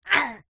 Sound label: cartoon voice high pitched pain grunt